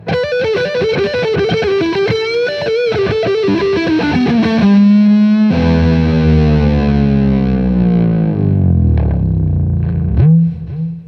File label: Guild S281 12th Fret